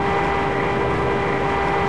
Turret Rotation; DCS

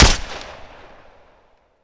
protogun 1shot

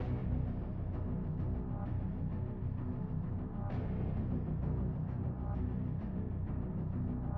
music interactive; World of Tanks

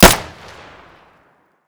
pm close; Escape from Tarkov

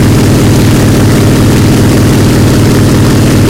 yak side, engines; War Thunder

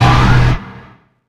jet burst

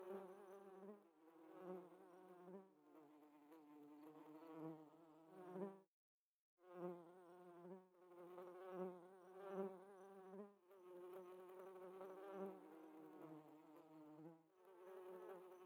ambience bees; Rust

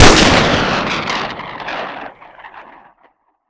dragunov special; Modern Warfare

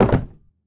wood sign deploy; Rust

